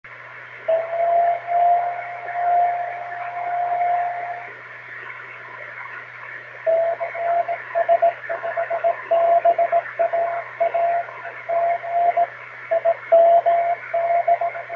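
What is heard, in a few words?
50.036 MHz, ham radio beacon; CS5BALG/B IM67 Portugal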